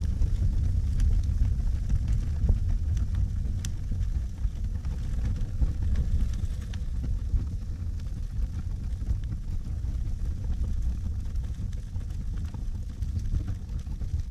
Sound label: fire Ambiance